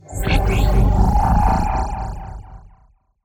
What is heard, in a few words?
portal Jump; spacemap